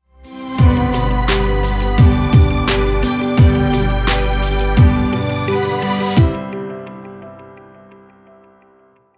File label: happy travel vlog hip hop music